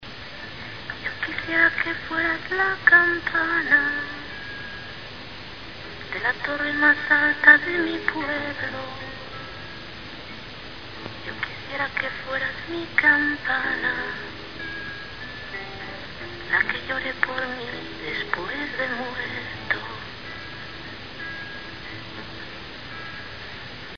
campana

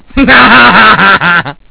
risa

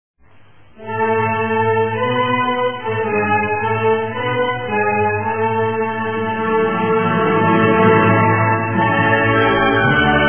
himno euskadi

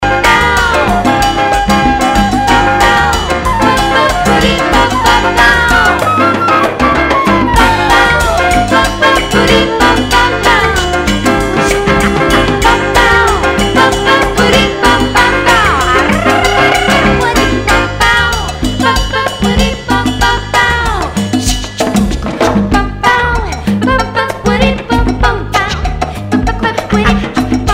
mambo del norte short

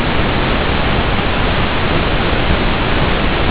ruido rosa

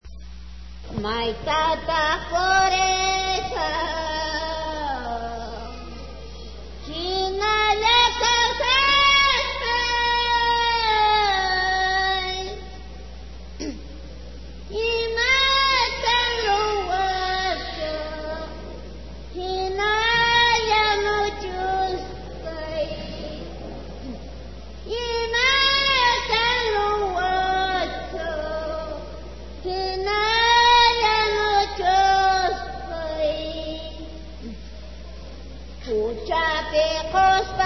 Canto a San Geronimo

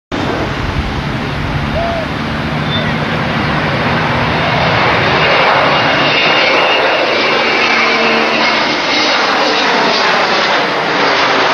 MD-81 decolaje desde cabecera; N10022 (LV-WPY); Austral; AEP

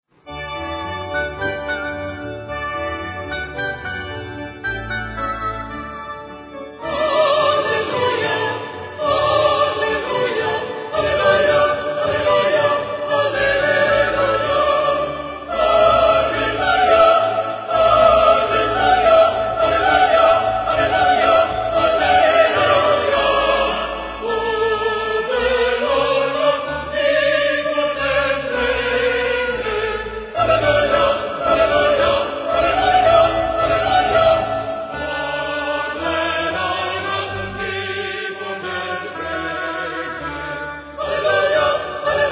aleluya sexteto; coros solistas; haendel

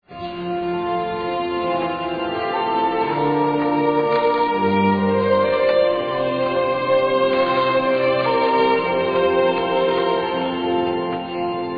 octeto; coros solistas; mozart; laudate dominum

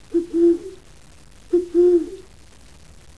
silbato; oaxaca

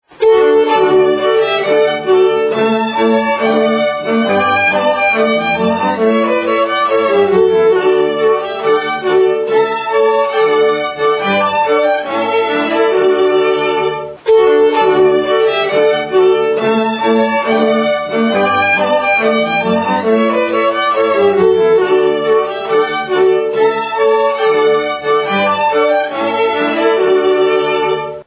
cuerdas; coros solistas; charpentier; tedeum

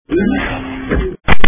cortacesped

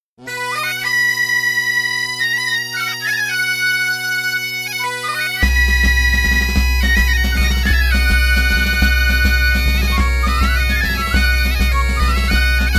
RUMBA

